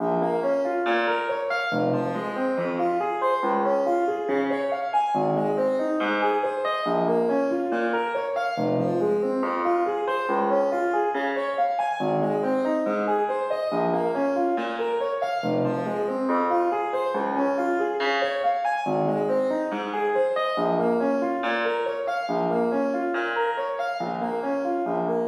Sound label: Break Arp Dry, 140 BPM; Vengeance - Essential Clubsounds Vol.5; Demo Constr Kits; Catch a Plane Kit; Root A#min; Synth